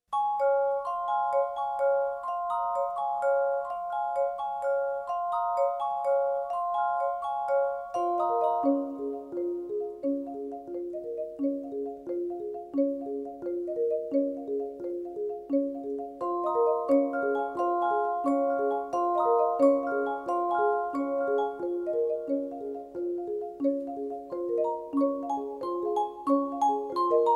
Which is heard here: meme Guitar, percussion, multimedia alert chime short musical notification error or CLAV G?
percussion